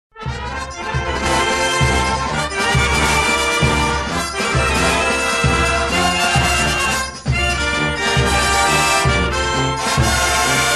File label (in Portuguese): Frevo